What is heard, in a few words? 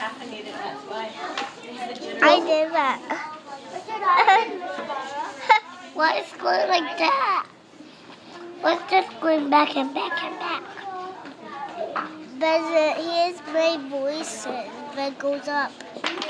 noises by children